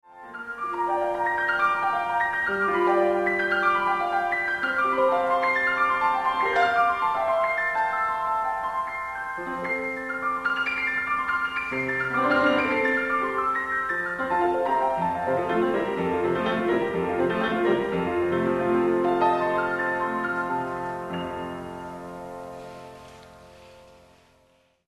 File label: Prelude in F Major Moderato